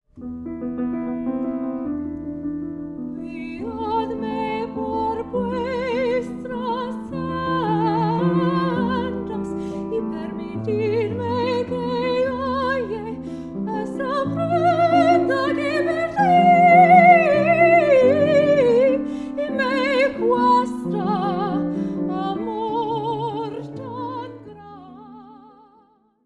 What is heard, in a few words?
Vocalist And Piano